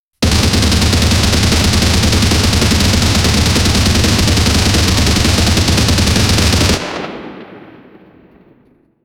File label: heavy machine gun fire, automatic